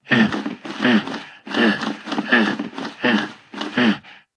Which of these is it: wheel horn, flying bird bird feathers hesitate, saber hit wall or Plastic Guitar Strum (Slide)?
flying bird bird feathers hesitate